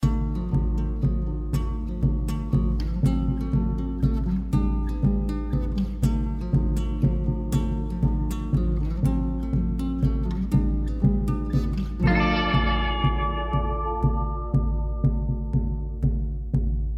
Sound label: Intro Menu; A Western Drama